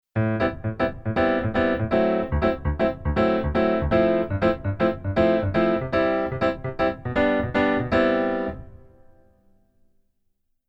Keyboard Pf Funk Pf

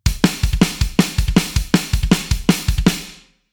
Rhythm Metal

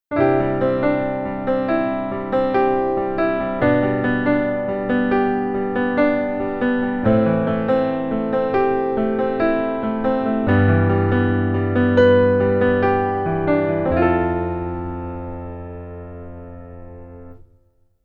keyboard rhythm

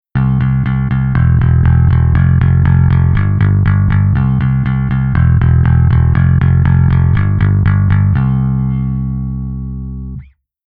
Bass Pick

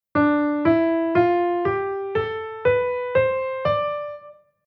Chord D Dorian; mode

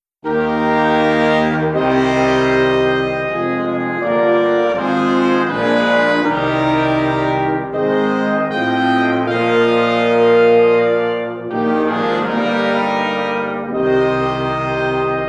Brass

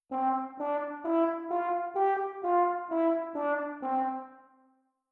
stacc

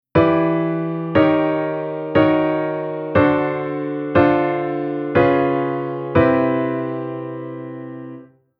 Chord Ex Dom

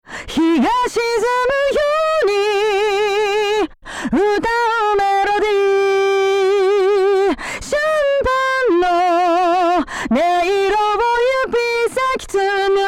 Distortion Over Drive Tone Minus

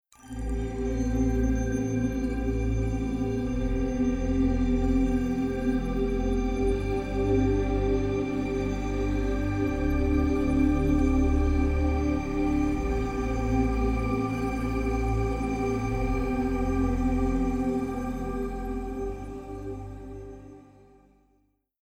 Future Bass Chord Pad All EQ Off

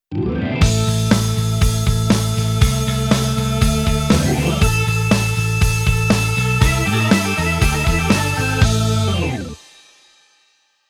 Keyboard Organ Rock

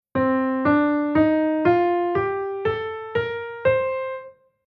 Chord mode C Dorian

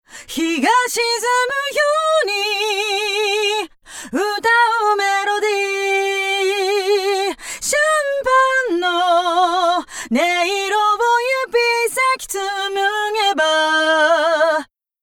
Chorus Flanger Rate Low

